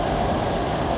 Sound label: Ship Engine Front; Ships; DCS